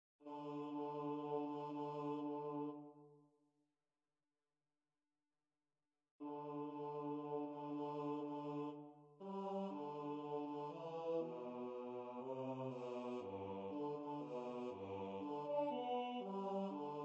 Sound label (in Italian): coro bassi; Idomeneo